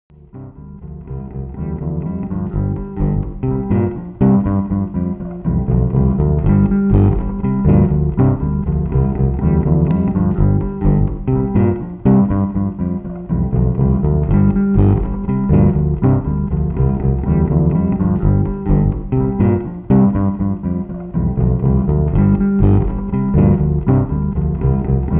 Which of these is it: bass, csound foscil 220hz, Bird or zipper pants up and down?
bass